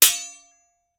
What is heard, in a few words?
Sword Hit